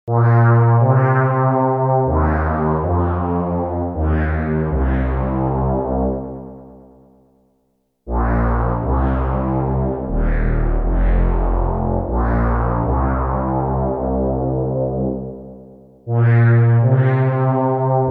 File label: Softpad sleezed